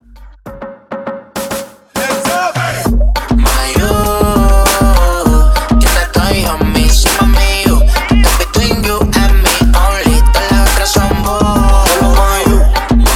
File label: Hip Hop Beat Latin Mixshow Top 40 100 BPM